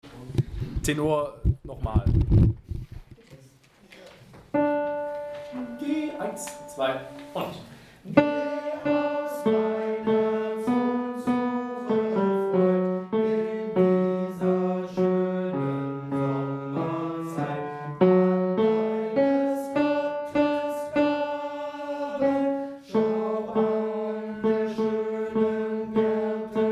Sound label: Tenor; Geh aus mein Herz